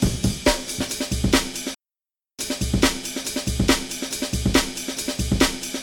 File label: Break